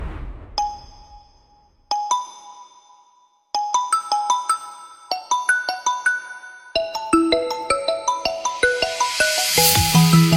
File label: Ringtone